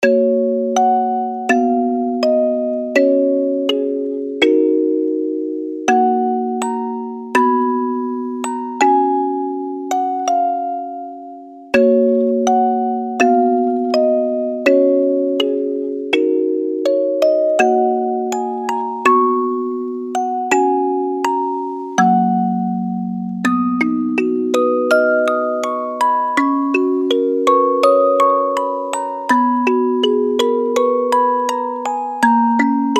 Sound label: music box normal